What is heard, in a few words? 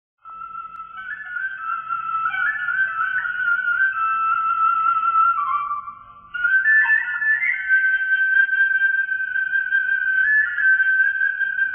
Shvi